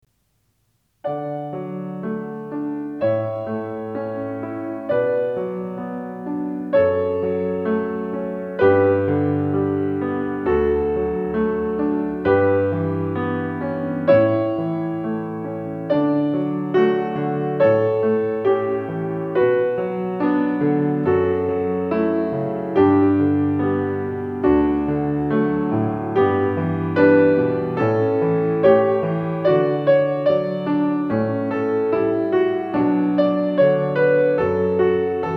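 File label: Canon in D, piano; wedding; Pachelbel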